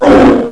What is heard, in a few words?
pain; HALFE LIFE 3